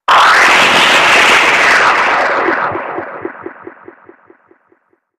Distorted Digital Scr, stinger

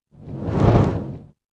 Fire Ball Catapulted

